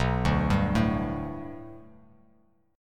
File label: B Minor 7th Sharp 5th B Bass Standard, chord; 7,5,5,2; Bm7#5 arpeggiated; JGuitar